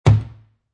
Empty Plastic Gas Can Being Placed Down On Concrete; Foley, Gas Can; Set Down